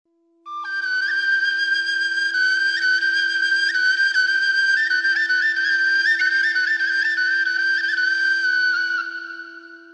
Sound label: shvi, Armenian musical instruments